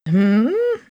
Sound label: humm; BattleSNDS